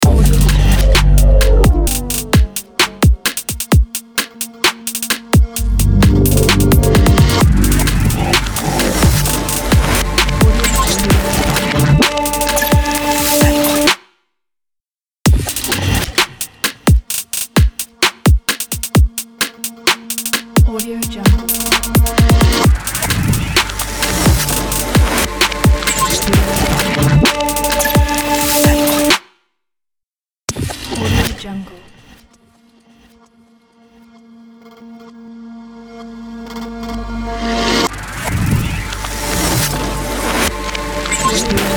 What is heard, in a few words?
cosmic breath logo